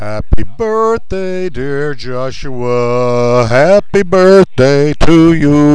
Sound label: birthday singing